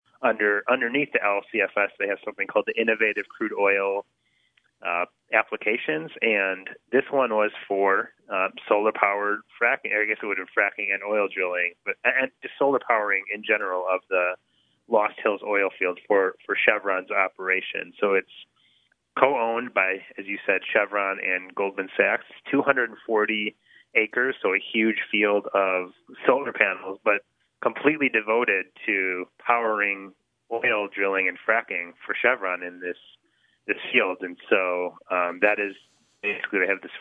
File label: Horn